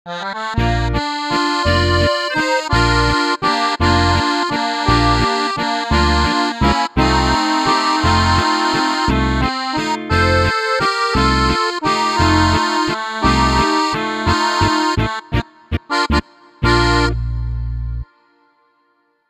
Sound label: E Bass normal; AZ 10 Morino